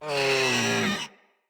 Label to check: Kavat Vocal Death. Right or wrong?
Right